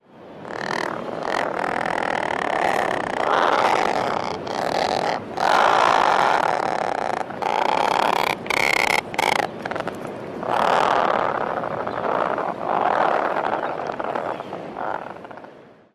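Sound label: Razorbill rattling growls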